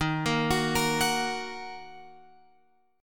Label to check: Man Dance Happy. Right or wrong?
Wrong